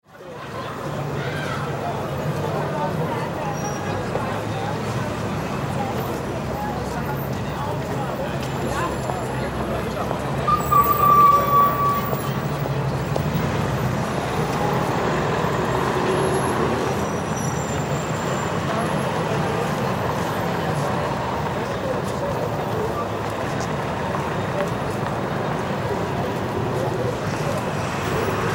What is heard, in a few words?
City New York Times Square; Cities; Ambience; Blastwave